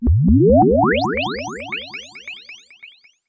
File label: Lazers